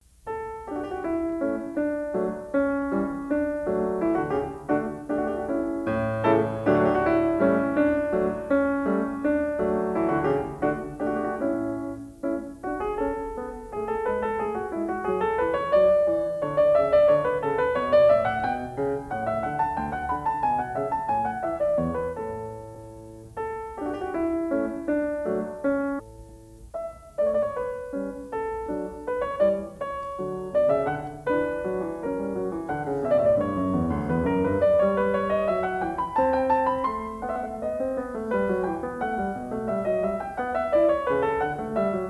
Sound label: Aria